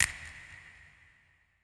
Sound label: snap cave